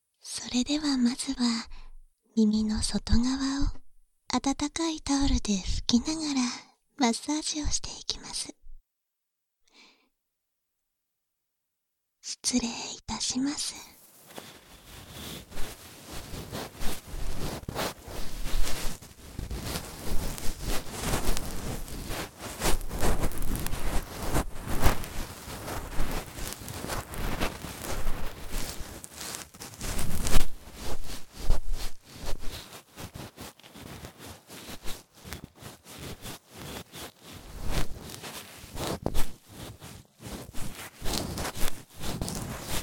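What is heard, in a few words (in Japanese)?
耳かき, バイノーラルマイク収録 囁き重視編集 効果音あり大きめ; 耳かき効果音差分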